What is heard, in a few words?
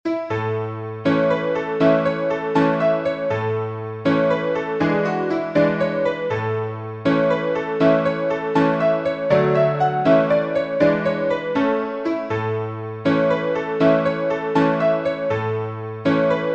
PIANO